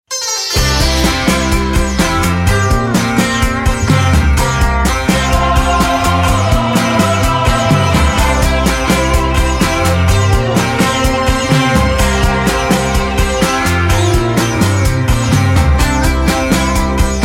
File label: ABBEY RAGA